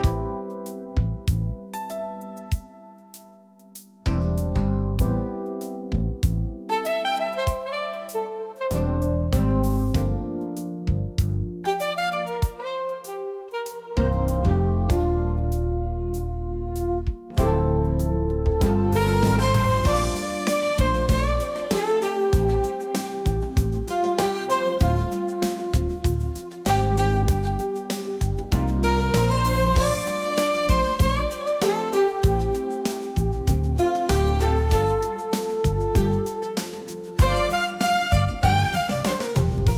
jazz, Chinese Style Jazz; suno

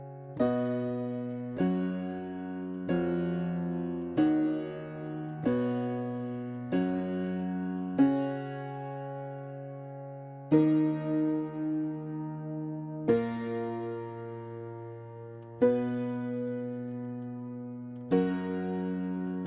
Piano; vanguard

